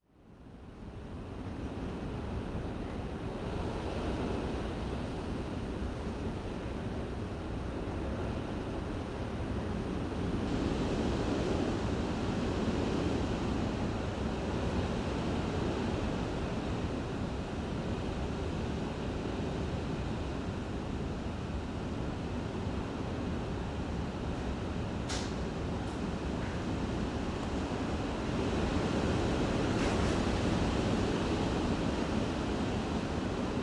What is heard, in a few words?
special hurricane irma home interior; fvtt sonniss gdc audio